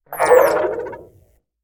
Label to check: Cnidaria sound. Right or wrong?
Right